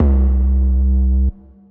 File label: fire 808